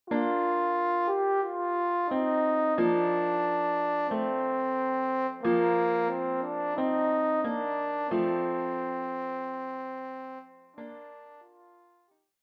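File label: horn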